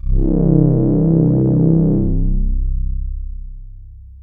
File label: Yamaha TX81Z Harmo Pad C3